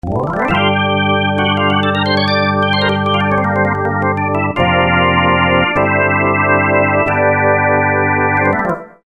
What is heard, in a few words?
Hammond